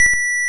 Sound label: Ym Multi Guitar B3; multi-samples; CHIPSHOP DELUXE